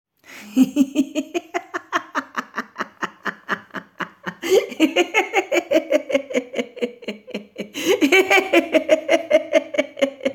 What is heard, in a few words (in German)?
Klingelton lacht